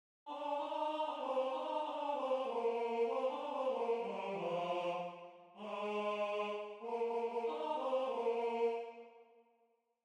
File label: Tenor